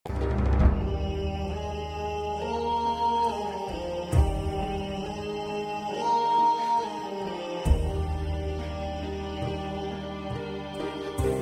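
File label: Background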